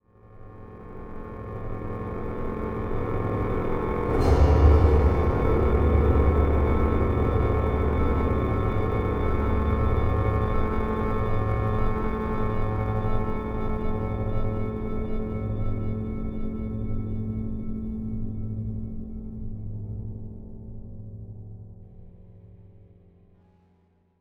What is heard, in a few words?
Night Amb Stinger, gamerip; dying light